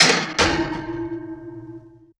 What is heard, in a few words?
Metal Hit, Hand To Hand Combat